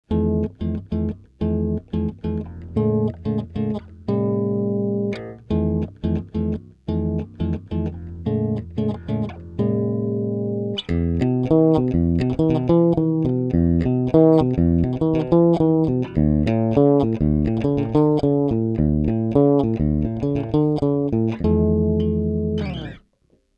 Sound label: Jazz